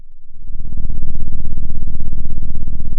Sound of an engine revving

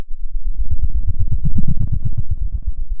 pop, Sound of a dinosaur

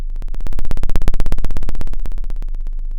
Sound of a plane taking off

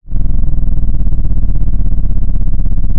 pop, Sound of an engine revving

